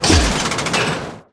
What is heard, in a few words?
evil machine damage, monster